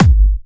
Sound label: Hard Kicks; Vengeance Samples